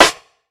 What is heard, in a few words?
wet acoustic snare b key; snare drum samples